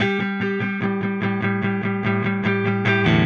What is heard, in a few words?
Silos Clean Strum Guitar, Indie Rock